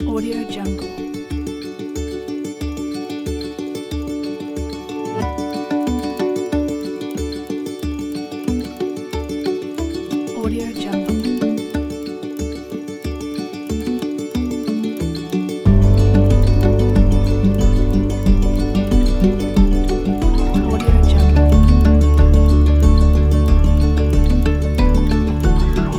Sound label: beautiful inspiring indie folk kit